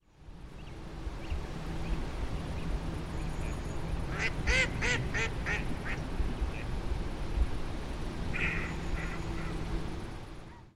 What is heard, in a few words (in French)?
Canard colvert Anas platyrhynchos; Natura 2000